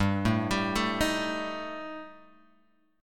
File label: G Suspended 2nd Sharp 5th, Guitar Open G